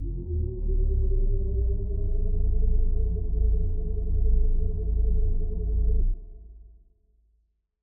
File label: warp engine engage